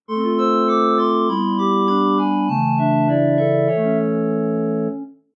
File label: jingle